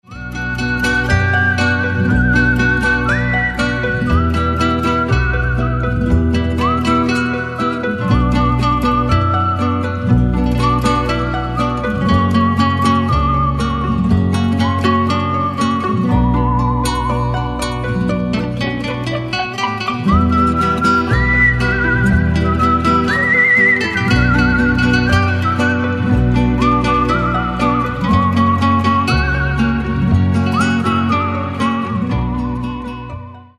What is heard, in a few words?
Mobile Ringtone